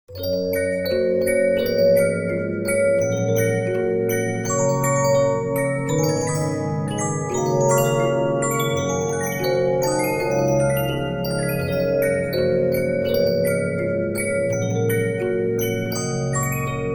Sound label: Regina, 15inch disc musicbox